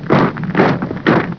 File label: Troops Moving; Onslaught